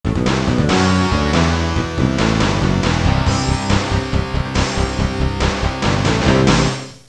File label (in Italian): suonerie musica rock